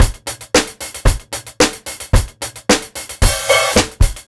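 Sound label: BEAT 2, 112 bpm, Funk; USB Soundscan vol.41; AKAI; Roots Funk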